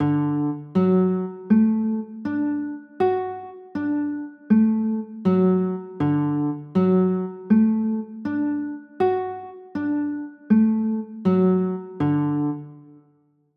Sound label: d maj, chords